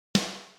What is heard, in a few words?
snare rimshot; Snare Drum: Rimshot